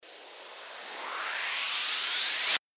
RISER

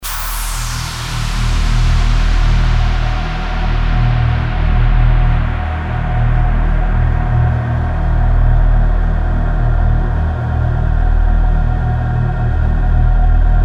DRONE HOLY DOOM 136BPM Eb

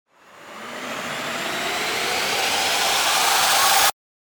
RISER

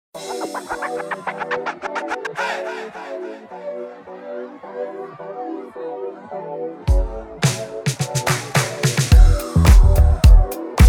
INST 107BPM F#m

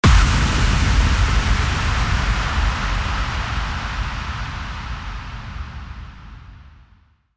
IMPACT

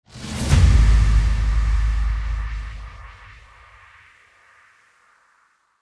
RISING IMPACT

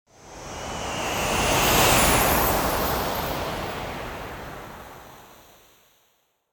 WHOOSH CHRISTMAS

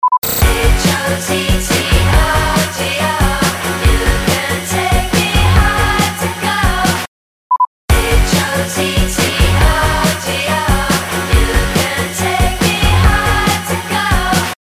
140BPM F#

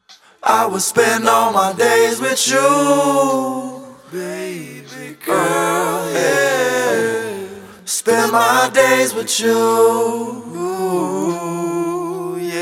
ACA 75BPM Dm

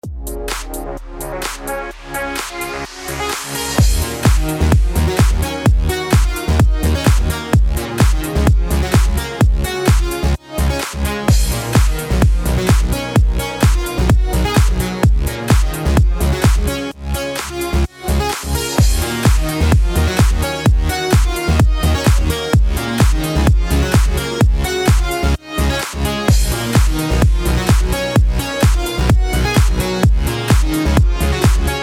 BED HOUSE D#MIN 128BPM

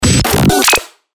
BREAKER; FX

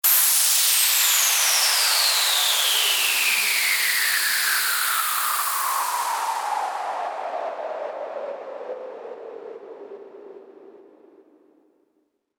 WHOOSH

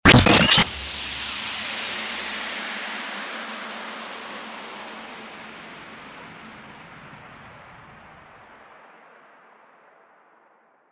STARTER WHOOSH

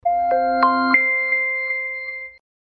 SFX AMAZON ECHO DROPPING IN

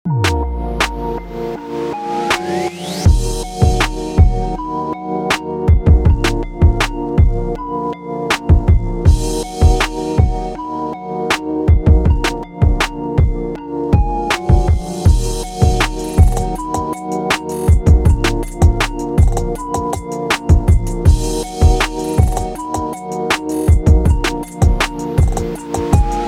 urban D# 160BPM; CAnfx

